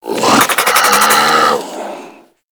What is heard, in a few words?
Infested Charger Idle, Enemies; Warframe